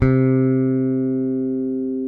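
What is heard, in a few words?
SB C2 SOFT, SPECTOR BASS; Northstar - New Gold VOL-1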